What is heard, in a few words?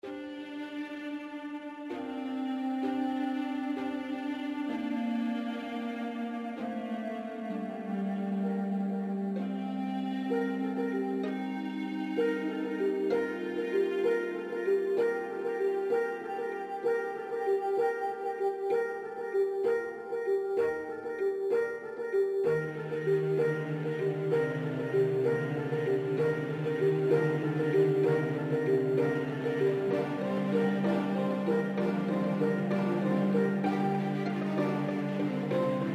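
christmas bells; Yuletide Wranglers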